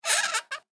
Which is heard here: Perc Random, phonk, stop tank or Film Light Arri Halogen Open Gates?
Film Light Arri Halogen Open Gates